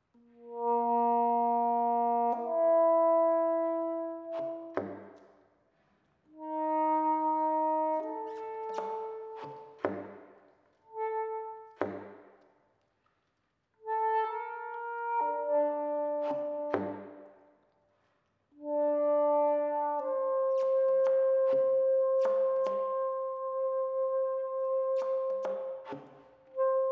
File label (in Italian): Quartetto di Sax